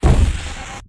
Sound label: Heartball Impact Hacked